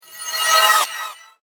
Magic Portal Spell, Magic Sound Effects; Rpg Fx; fantasy

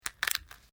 DRY BRITTLE SNAPPING

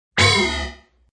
Alarm Hit; Multimedia

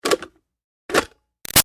Switch Plastic Bubble Machine On-Off Hard; Switches; Objects; Big Room Sound

